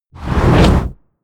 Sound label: push